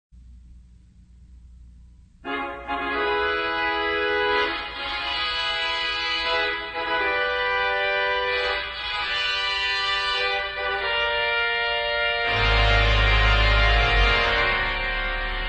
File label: sassy brass